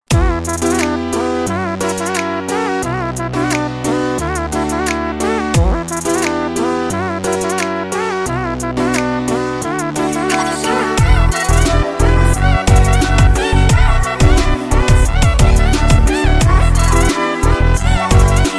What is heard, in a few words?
Phonk